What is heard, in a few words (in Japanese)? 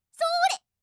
攻撃